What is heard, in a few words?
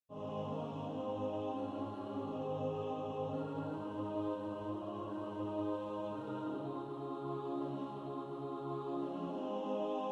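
alto, practice music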